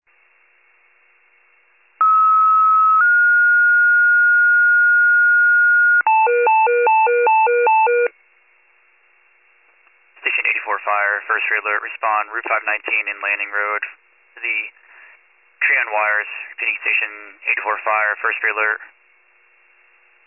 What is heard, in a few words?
fire, pager alert